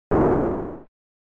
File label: Arcade General Noise